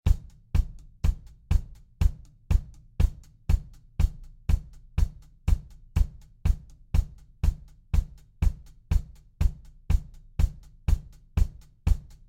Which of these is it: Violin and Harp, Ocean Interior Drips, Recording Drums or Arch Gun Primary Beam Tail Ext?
Recording Drums